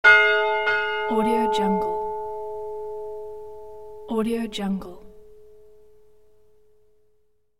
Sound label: historic church bell rings once; cartoon; cartoon sounds